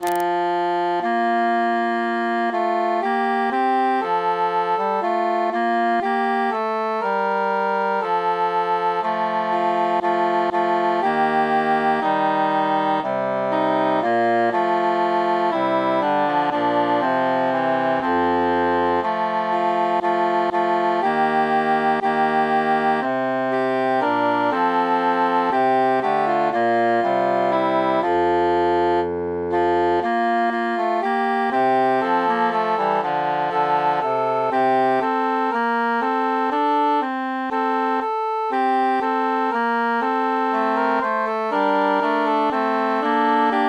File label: altus mute